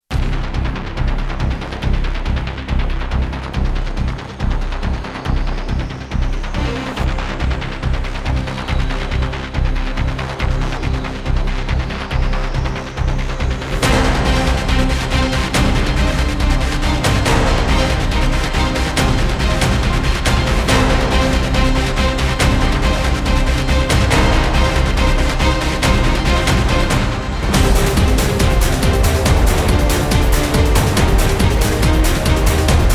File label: no choir; immediate music